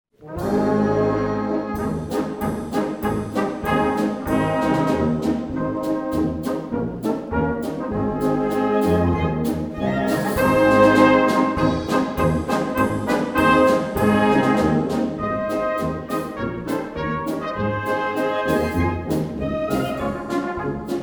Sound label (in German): polka